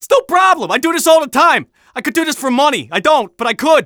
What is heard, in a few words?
Scout taunt conga fun